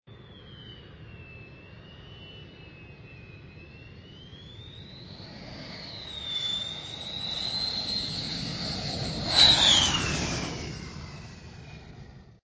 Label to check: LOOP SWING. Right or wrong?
Wrong